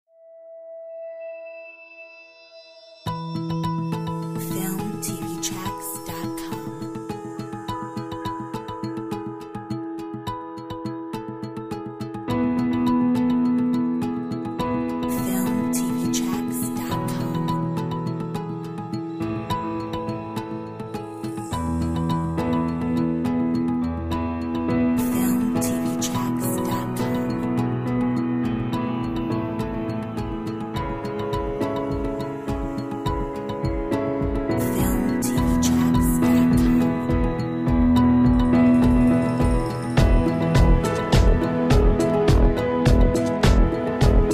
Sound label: Optimistic Keys